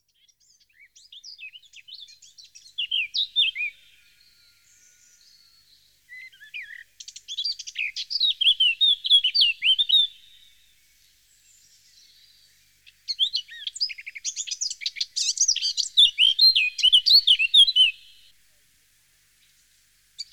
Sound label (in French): Fauvette a tete noire, chants d'oiseaux; Jean C. Roche